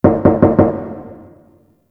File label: Ghost Cargo Knocks; Ambience; Props; Warframe; The New War; Zariman